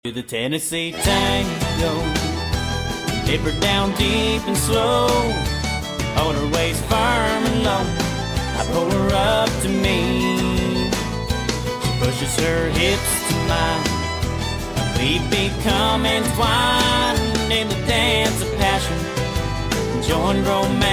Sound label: tango